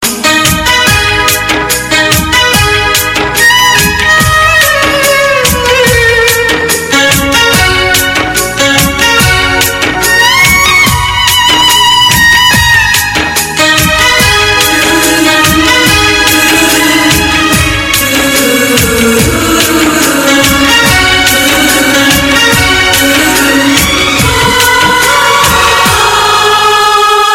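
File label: instrumental, ringtone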